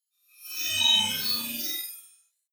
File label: swell short metal string computer